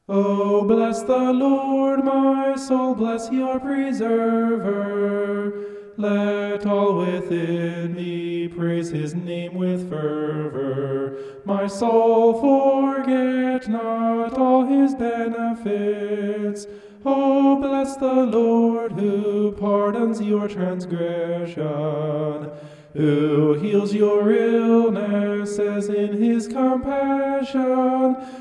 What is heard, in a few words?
vocal homoph; psalm 103